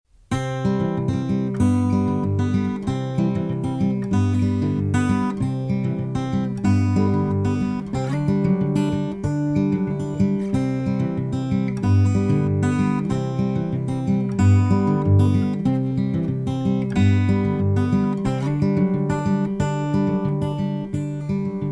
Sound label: Acoustic Guitar Picking Low; se electronics gemini ii